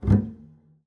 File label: pizz noise strum, double bass